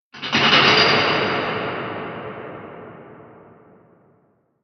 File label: abyssal hammerhead idle; Barotraumatic